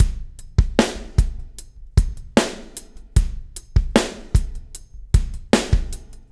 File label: REV, REVEREND; Spectrasonics; Backbeat